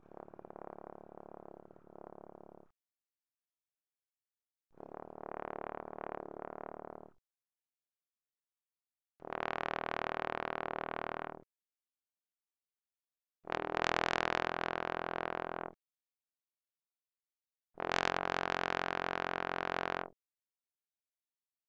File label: Bass Trombone ff Db1 B1; Brass